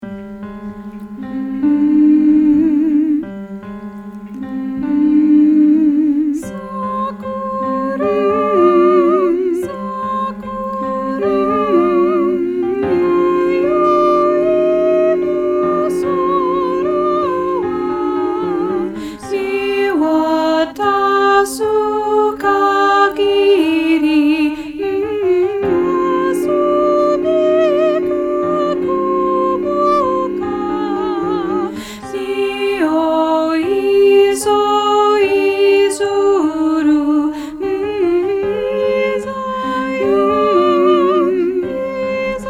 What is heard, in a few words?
Sakura Sakura Alto